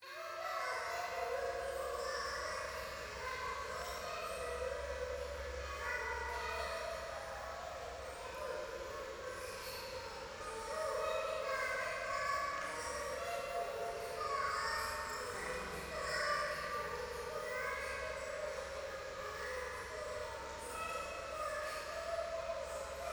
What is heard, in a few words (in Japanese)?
廃墟 可愛い喘ぎ 雨